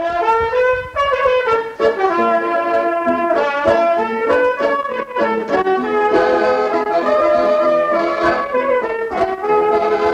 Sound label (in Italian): Valzer